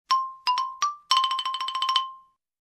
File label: Xylophone ending melody variant